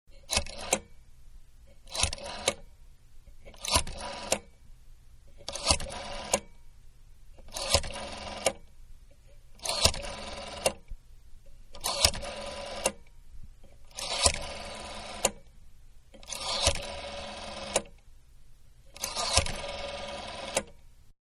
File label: Rotary Dialing In Numerical Order, Telephone; Telephone-Rotary-Dialing In Numerical Order; Telephones; Manhattan Production Music